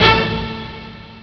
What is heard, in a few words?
mt32 hit, Amiga Soundtracker Sample Packs